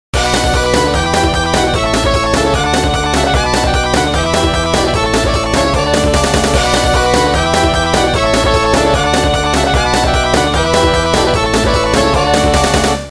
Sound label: Rock